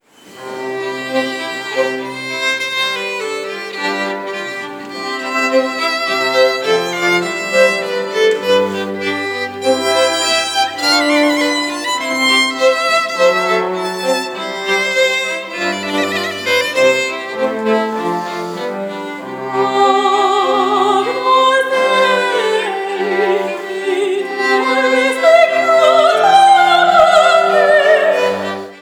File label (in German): Agnus Orgelsolo; Mozart; Orgelsolo-Messe